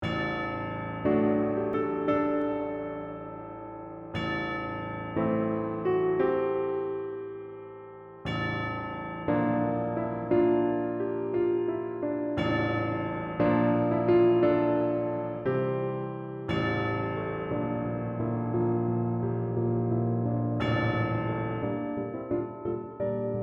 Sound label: piano; 2020 Cutlass Crew